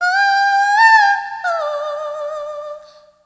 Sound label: OOH RIFF 2-L, Vox FX; E-MU Formula 4000 Series Vol. 1 - Hip Hop Nation